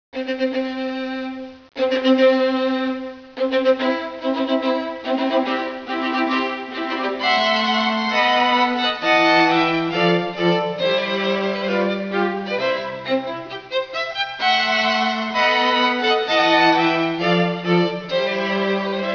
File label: weddingmarch